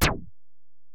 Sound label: EPerc, Alesis DM-PRO; 300 Drum Machines